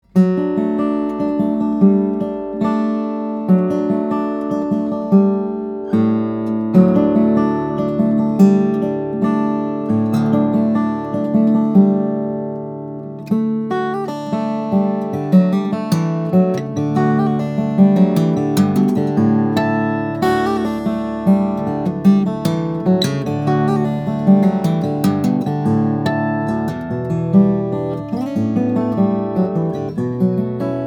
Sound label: SJ Cutaway, guitars, steel-string guitars, flattop; applegate; cocobolo; western red cedar